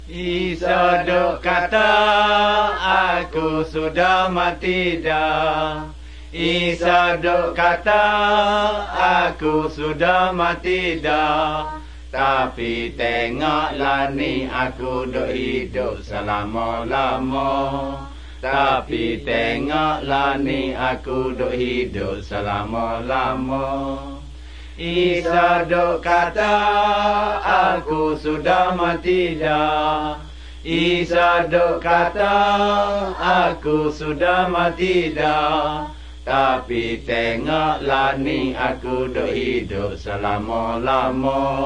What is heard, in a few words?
songs malay pattani